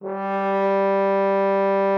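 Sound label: French horn G3; Xsample VOL-3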